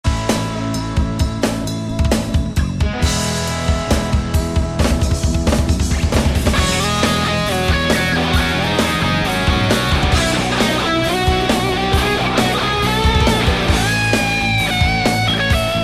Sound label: rock rythm soloist